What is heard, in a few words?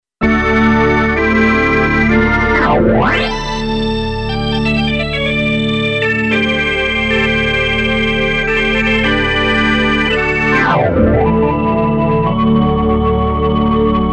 e organ; moss